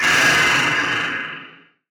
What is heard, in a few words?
attack; Barotrauma; Legacyfractalguardian